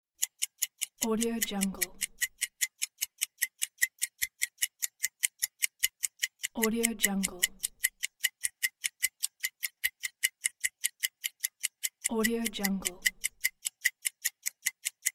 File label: stopwatch clock ticking